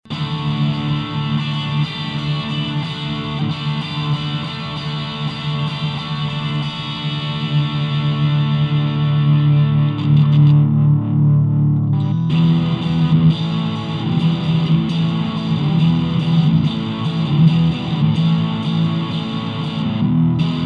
tbone rb770 e gitarre

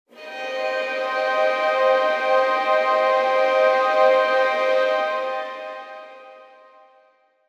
String Ensemble Violinen Harmonics

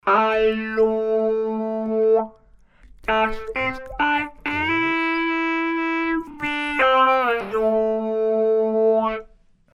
Dunlop MXR M222 Talkbox epiano mit max gain

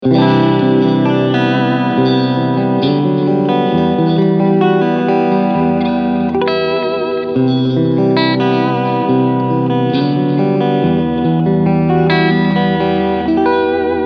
Clean SC und Steg HB

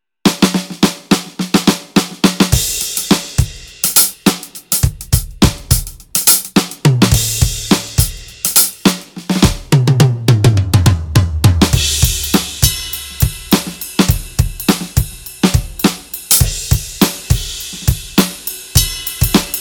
gewa G5 Modul Drum Kit

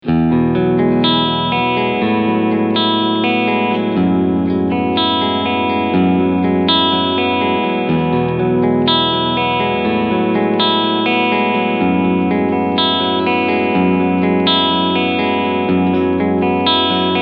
Cleansound beide PUs

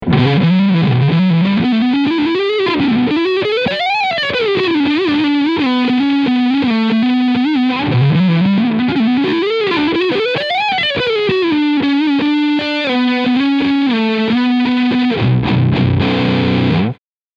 Leadsound Front HB

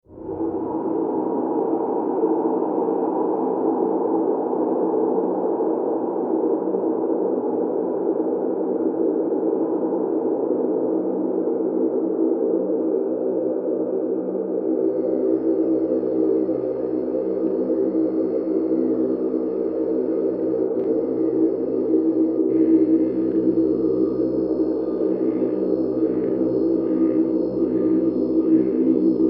Casio SK-1 Sample Ahh Teil 2 etwas extremer

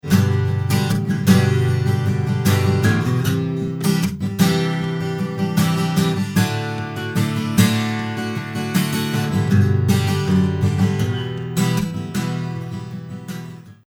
Akku Git Room

